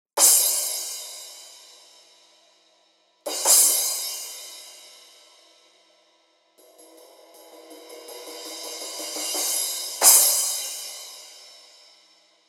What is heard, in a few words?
JazzMaster Crash 14